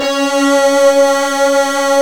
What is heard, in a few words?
SYNTH CHOIR, D - 70; Rubber Chicken Software Sample Bank